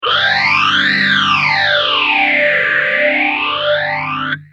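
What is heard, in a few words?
guitar boss ph3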